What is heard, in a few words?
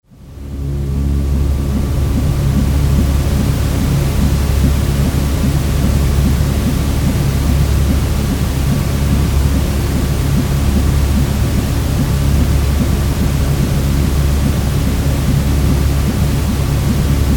baby sleep mix